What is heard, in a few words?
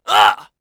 get hit voice; UFC Tapout